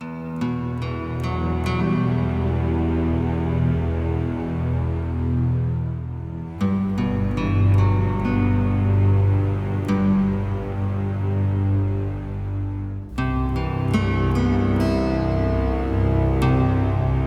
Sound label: Select Menu Music Loop; Duviri; Warframe